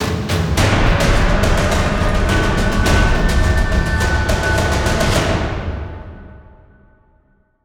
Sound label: Stinger Fill